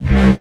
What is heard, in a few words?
Bass C, Offbeat Bass